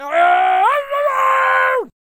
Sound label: Farmer Attack Vocals